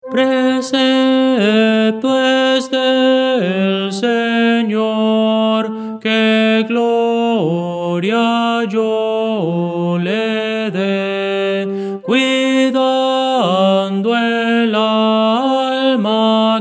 tenor

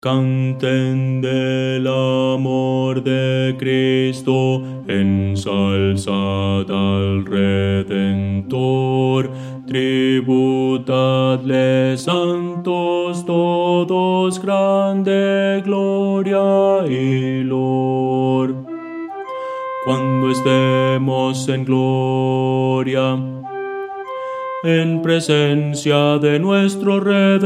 bajo

